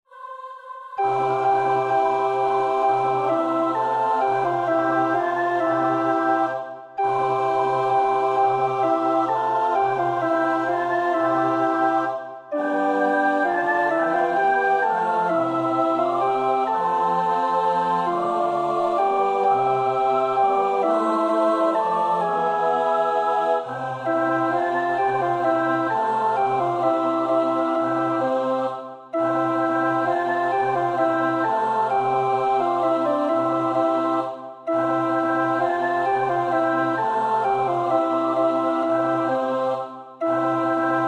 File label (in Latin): In dulci jubilo vers 4 (alt 2); Praetorius (Michael)